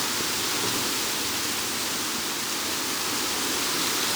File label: emitter wind in tree